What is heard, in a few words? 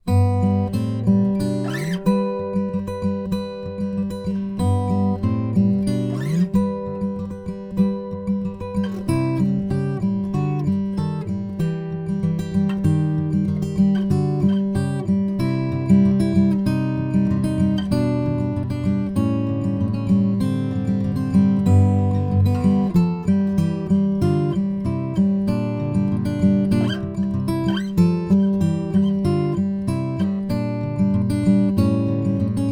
finger, guitars; j-kaiser